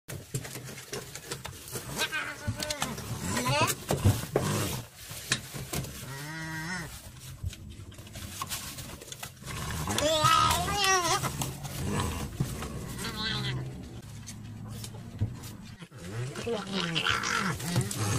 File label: Nutria; نوتریا